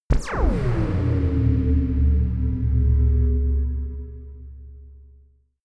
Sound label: dark short laser blast; krieg-waffen; soundpool